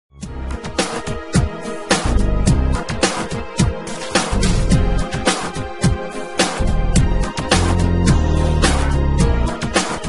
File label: Hollywood Music Ringtone